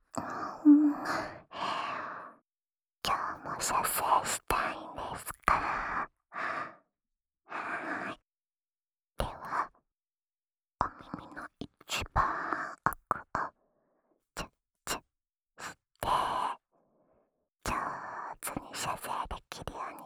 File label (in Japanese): オナサポ用 ふっかーい 耳舐め （右耳）